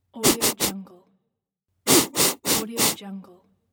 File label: human sniffing